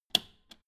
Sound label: old button simple press